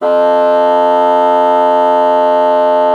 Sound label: MULTI, bassoon; Xsample VOL-6